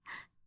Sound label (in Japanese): ブレス ショート